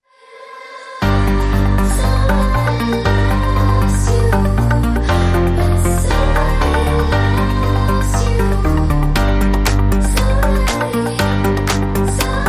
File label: Electro Clubbin